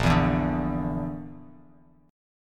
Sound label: Bb Major Flat 5th Bb down Bass Standard, chord; strummed; JGuitar